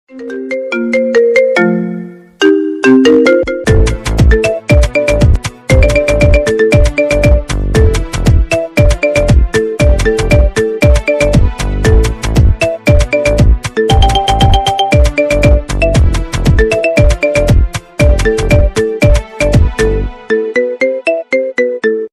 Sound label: Marimba